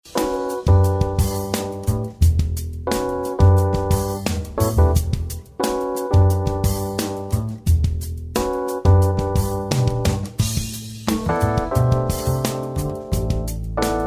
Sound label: contempo jazz